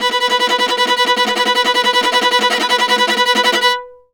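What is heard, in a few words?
VIOLA tremolo B4; Orchestral; DirectWave; Varazdin Orchestral; Viola II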